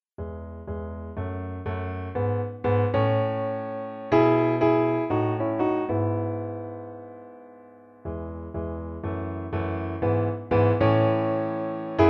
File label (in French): Classical swing Piano seul; Jazz